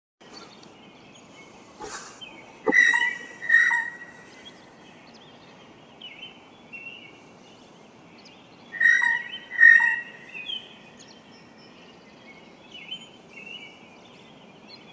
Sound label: Blue Jay, Cyanocitta cristata; jays and crows